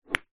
household aftershave or perfume glass bottles x2 slide into each other on wooden; zapsplat